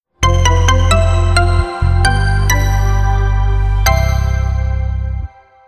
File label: Power Off; Oppo Real-R807